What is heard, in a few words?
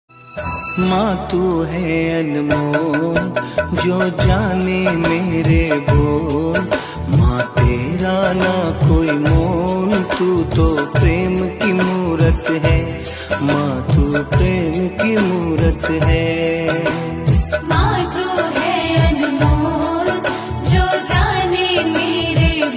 Ringtone